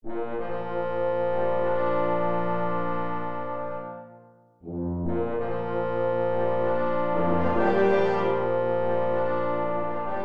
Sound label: Fanfare, brass; Le Martyre